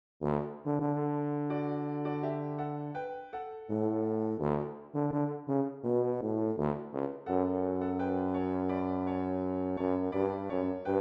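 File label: Duet for Tuba and Piano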